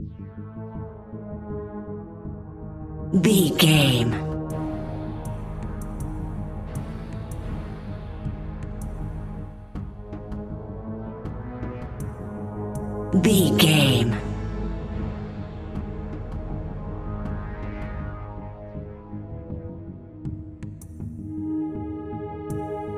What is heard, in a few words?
sci fi pack song approaching fleet